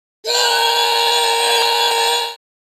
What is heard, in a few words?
Armor scream